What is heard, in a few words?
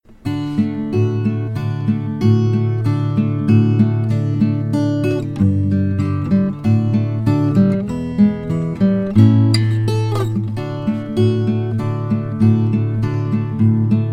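jingle bell arpeggio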